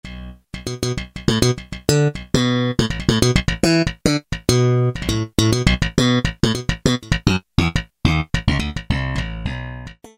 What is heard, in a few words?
Slap, Roland U-110; Factory Patch